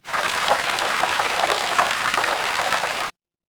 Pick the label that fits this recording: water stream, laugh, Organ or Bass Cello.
water stream